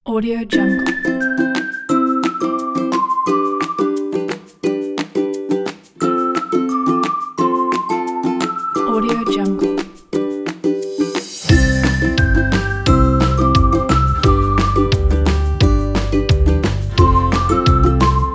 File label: joyful walk